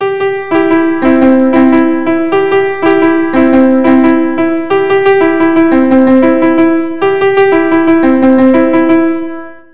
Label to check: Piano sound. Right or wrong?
Right